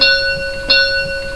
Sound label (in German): Glocke x 2